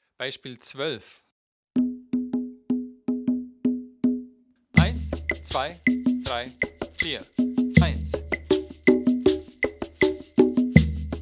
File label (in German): viererrhythmus